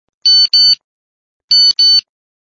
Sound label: sms